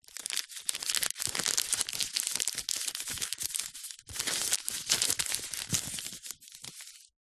household plaster band aid in paper seal handling

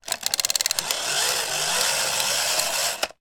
toy motorcycle or car pushing; sound effects one

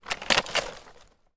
branch tree palm dead dry movement ground; foley; zapsplat

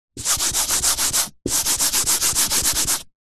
office eraser blackboard rubs fast

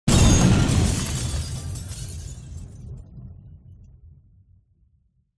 explosion large building debris glass; zapsplat

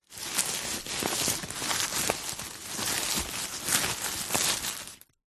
foley sack mesh thin handling movement

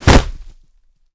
Body fall cement; foley walkers

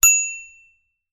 bicycle child bell ring

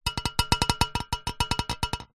foley glass pane small vibrate taps on metal; zapsplat

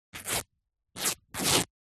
shirt cotton thin rip x3

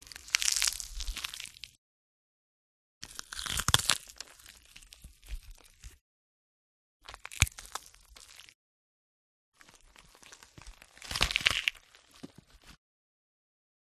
mush crush crack bell pepper; audiotank

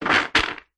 foam bubbles bath squeeze squelch hand; household; zapsplat

